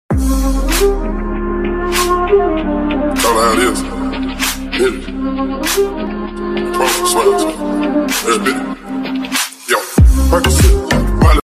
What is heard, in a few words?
arabic ringtone